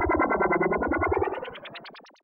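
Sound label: synth, Production Effects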